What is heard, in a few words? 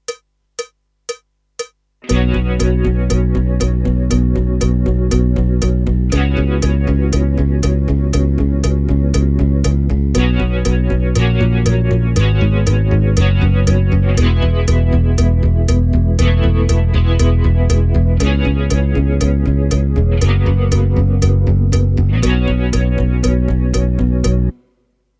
MU100 Trem; MidiGuitar